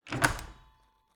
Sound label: Cabin, front door, split door, oak, large, iron fittings, close, hyper; Eiravaein Works; Vaeyan IV